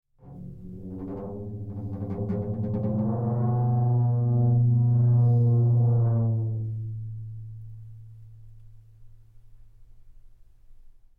Instrument Floor Tom Drum Friction Mallet Small Vibrate OmniMic; Guild Wars 2 (Original Foley Soundtrack)